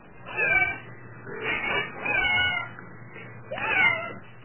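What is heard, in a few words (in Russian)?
несколько маленьких котят пищат из коробки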